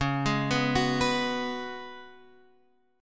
C sharp 7th Suspended 4th C sharp, Guitar Standard; C#7sus4; arpeggiated; JGuitar